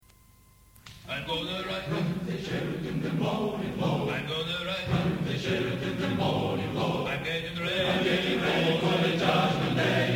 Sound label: male choir